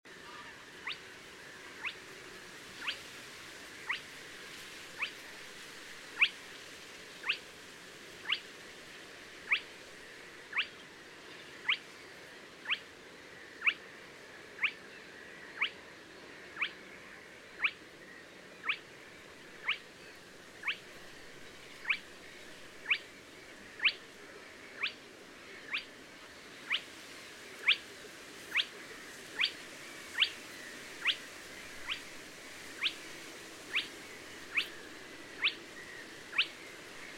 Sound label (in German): Tuepfelralle; Tüpfelralle; 26.06.2009, Rieselfelder Münster; Jan Ole Kriegs